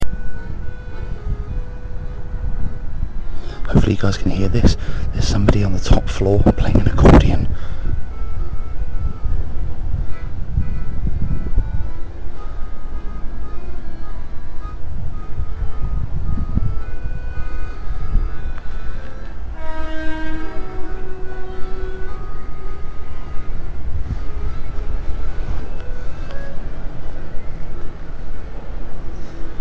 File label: accordian